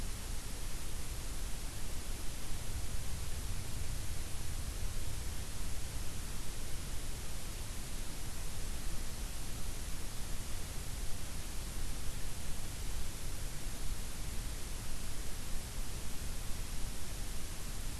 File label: WFR audio emfisis